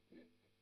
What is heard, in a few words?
Strum Octav